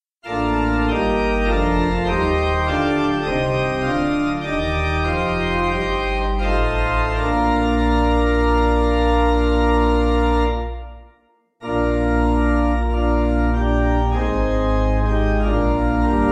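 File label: pipe organ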